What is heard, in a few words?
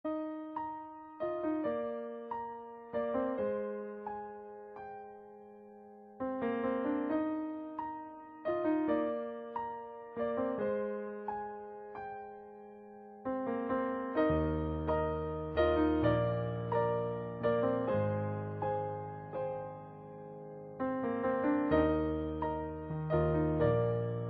Piano